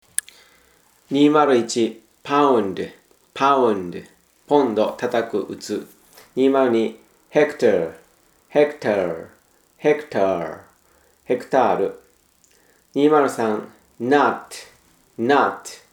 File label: tango